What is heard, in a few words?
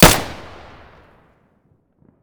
muzzle stereo, weapons; S.T.A.L.K.E.R.; IWP; fort